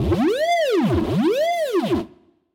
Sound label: siren